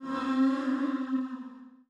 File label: Rainner